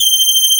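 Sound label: brass G5, SYNTHESIZER analog brass; AKAI S6000 CD-ROM - Volume 1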